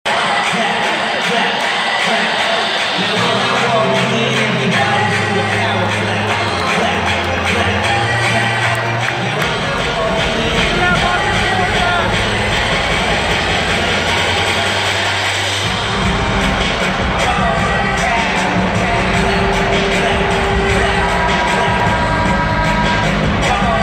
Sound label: Power Clap